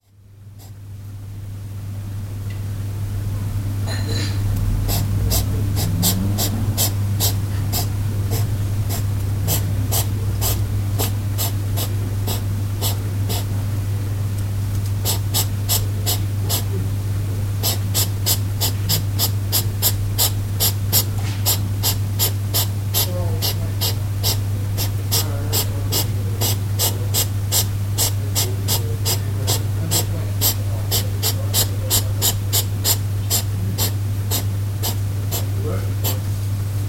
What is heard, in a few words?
Back garden hedgehog